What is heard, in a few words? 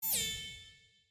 close window